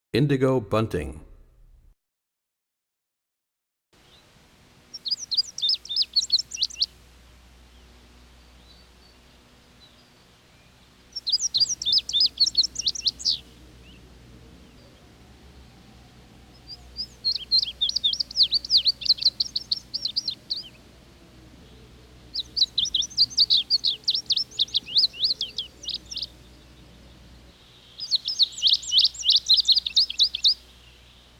Indigo Bunting, Birds; 101 Bird Songs

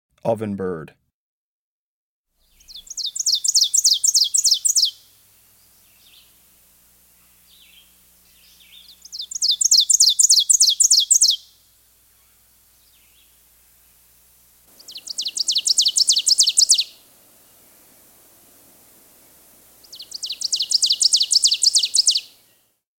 Ovenbird, Birds of the Chicago Region

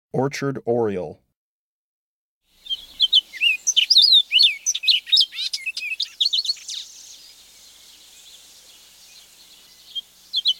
Orchard Oriole, Birds of the Chicago Region

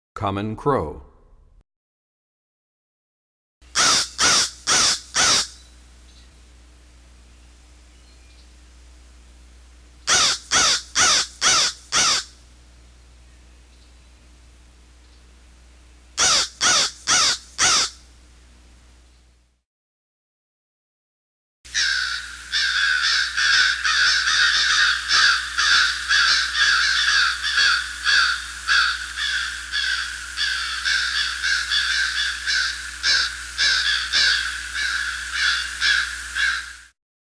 Common Crow, Bird Songs; 101 Bird Songs

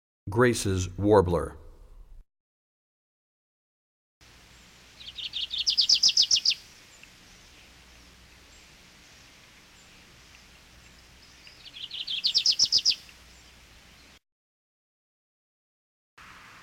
Grace's Warbler, Bird Songs; 101BirdSongs

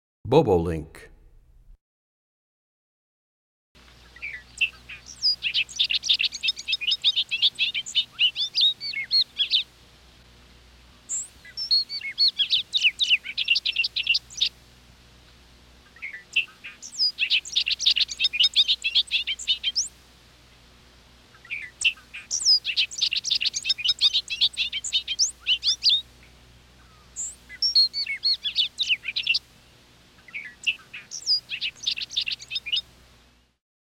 Bobolink, Bird Songs; 101 Bird Songs

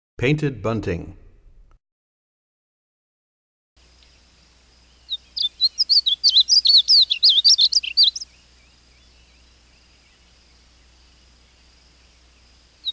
Painted Bunting, Birds; 101 Bird Songs

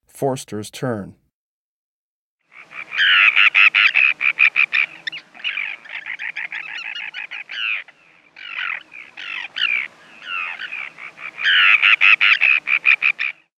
Forster's Tern

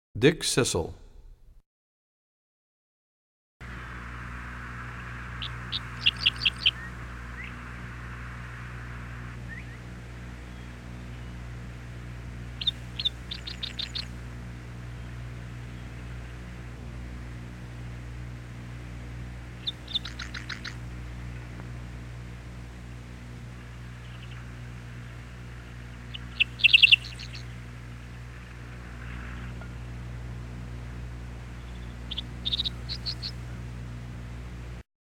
Dickcissel, Bird Songs; 101 Bird Songs

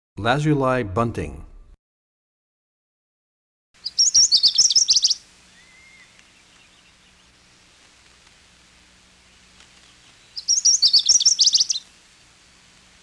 Lazuli Bunting, bird songs; 101BirdSongs

